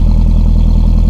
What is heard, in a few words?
Nissan Skyline R34 Idle